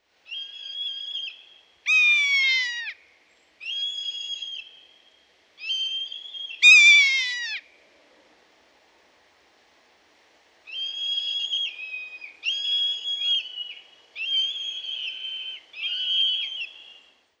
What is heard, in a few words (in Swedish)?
Fjallvrak